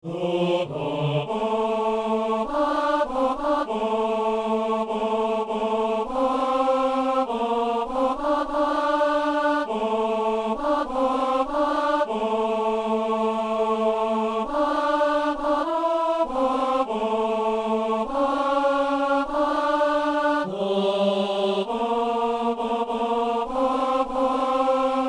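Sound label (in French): ténor; messe inaugurale; sanctus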